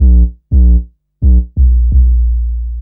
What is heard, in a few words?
Raw Bass, Drum & Bass; Fluid Dynamics